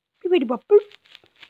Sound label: Beep bop beep bop